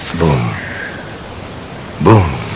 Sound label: single creepy boom